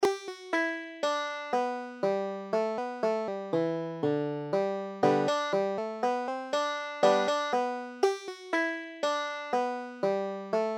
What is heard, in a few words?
banjo